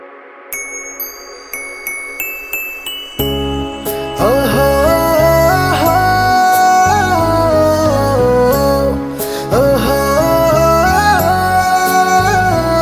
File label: Pleasent Ringtone